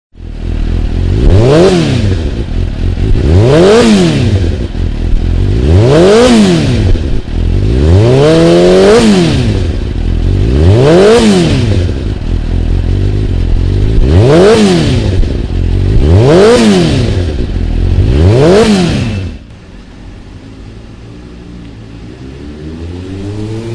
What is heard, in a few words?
Suzuki GSXR600 599cc In-Line 4 cyl engine sound; 2002; Section Six: The Soul of a Bike – The Engine